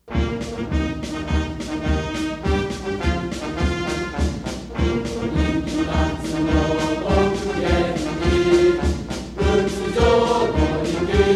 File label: old cheer song